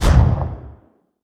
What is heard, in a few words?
MK108 End; Weapons; DCS